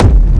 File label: Techno Kick; 30 Techno Kicks 2025; Ghosthack